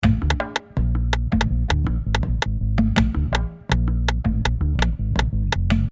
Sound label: loop